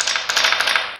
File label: Dice Spin